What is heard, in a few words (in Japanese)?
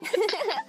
笑（おお〜ええやん）